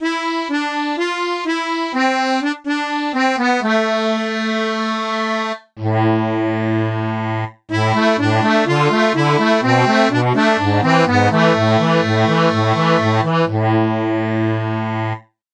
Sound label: bandoneon